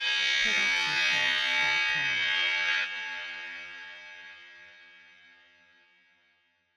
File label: anime signal horn; SoundsCrate